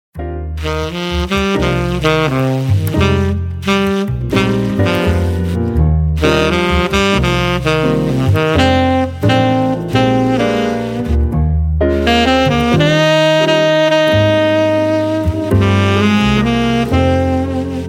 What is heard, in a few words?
jazz; jazz swing bands